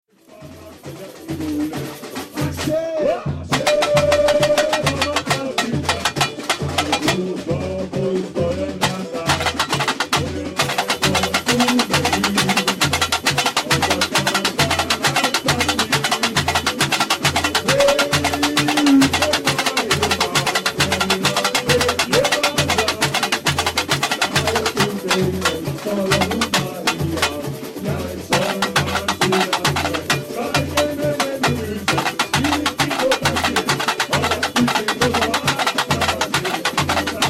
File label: tamborim, bateria